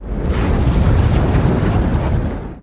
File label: Dragon Fire Whooshes; With Fire Crackle Element X 10